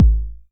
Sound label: 909 BD; Goldbaby Tape808 & Tape909; The Tape 909